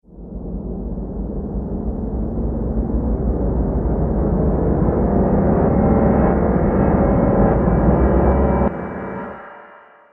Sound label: Horror Dark Piano, Piano Sound Effects; musical